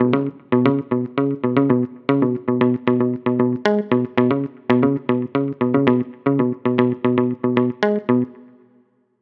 Melody Pluck Disco; midi wizard 2; Unison

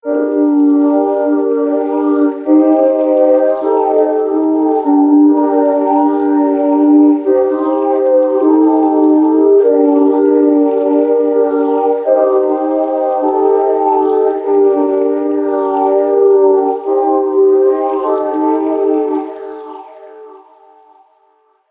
Chords

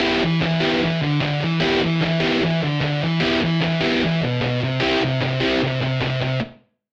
Hybrid Advanced Rock; midi wizard 2; Unison

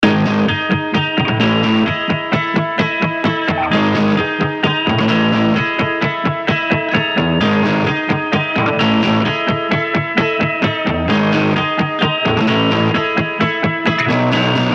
130 BPM Gm; Maniacal

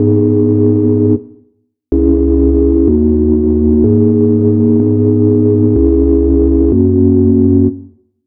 G Minor Prog

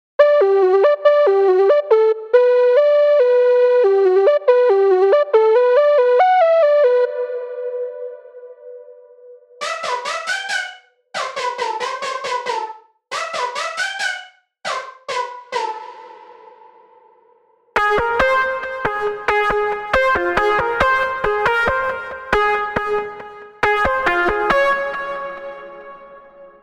Melodies Future Bass Melodic Trap

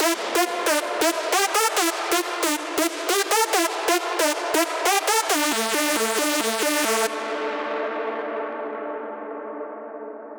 Melody Lead Future Bass; midi wizard

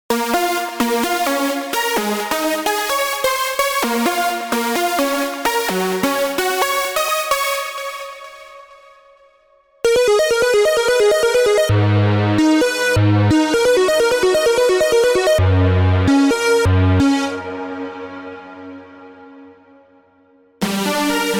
Melodies Trance Psytrance

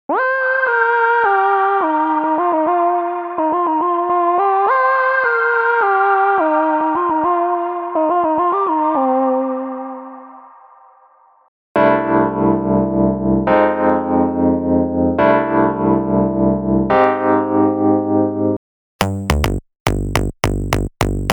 Neo-Soul; unisynth

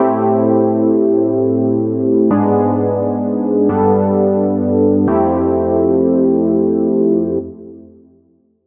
A Minor Prog

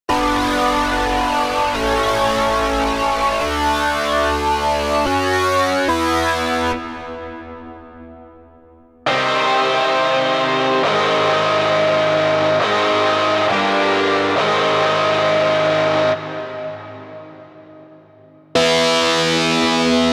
Chords Rock Metal

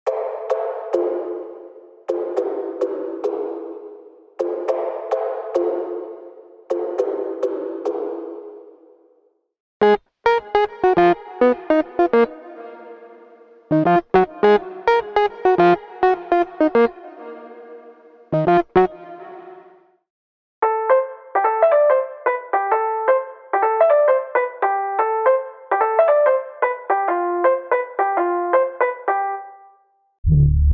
Dancehall & Afrobeats; unisynth

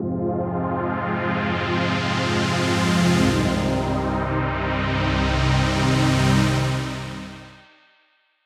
Chords Full Dubstep; midi wizard

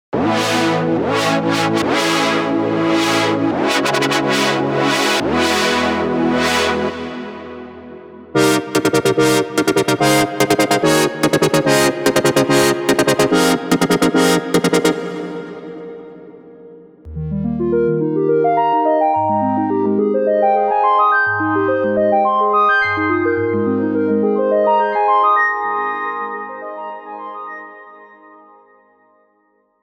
Chords Future Bass Melodic Trap

